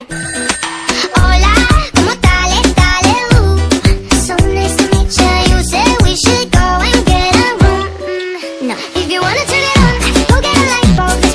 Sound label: Mobile Ringtone